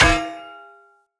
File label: Polearm Medium Impact Block Defender; Weapon Impacts; Combat